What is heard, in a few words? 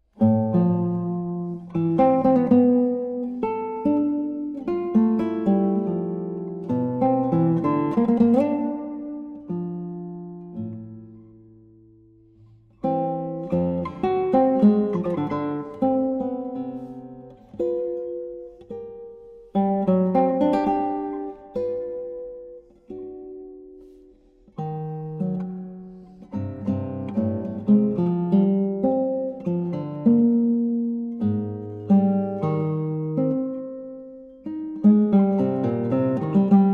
for guitar solo